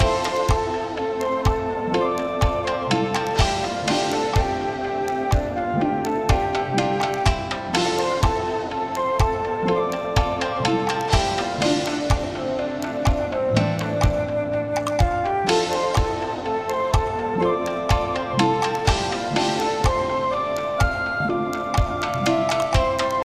ambience free spin; whos the bride

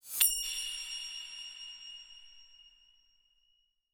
Coin With Whoosh; godsoffortune

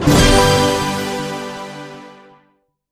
large Win; dazzle

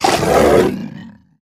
win lynx; wolfcub

